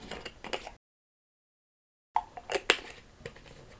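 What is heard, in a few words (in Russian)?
звук открытия закрытия бутылки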